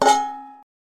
Large Metal Pan

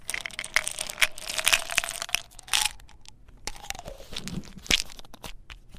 Pill Bottle; Mike Koenig

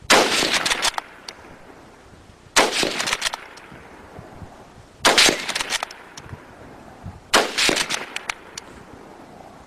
World War 2 Rifle

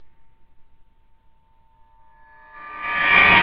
Suspense

